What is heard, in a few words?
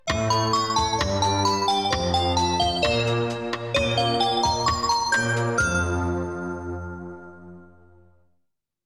Game Over; Legend of Game Music Premium Box; Top Landing